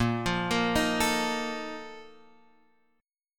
A sharp Major 7th Suspended 4th

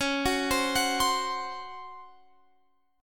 Db Major 7th Suspended 4th, Guitar Open F; arpeggiated

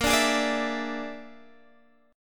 Bb 7th Flat 5th Bb, Guitar Half Step Down

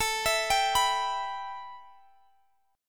E Minor A, Em/A chord; arpeggiated

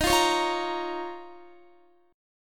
Eb 7th Suspended 2nd Eb, Guitar; strummed; x,18,15,18,17,17

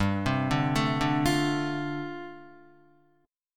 G 7th Suspended 2nd Suspended 4th, Guitar; G7sus2sus4 arpeggiated

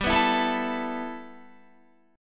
G 6th Add 9th G Guitar, chord; G6add9 strummed

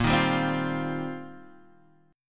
C Minor 11th; chord shape

